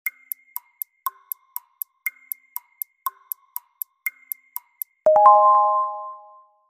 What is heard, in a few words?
timer End